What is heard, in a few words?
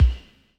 BD; Best Service; Dance Mega Drums